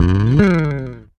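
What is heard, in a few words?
SB SLIDE, SPECTOR BASS; Northstar - New Gold VOL-1; SPEC.FUNKBAS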